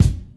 clear bass drum a sharp key, Royality free kick drum sample tuned to the A# note. Loudest frequency: 601Hz; kick drum samples